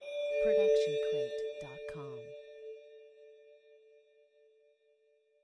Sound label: shimmering in the distance; video game; video game bundle; soundscrate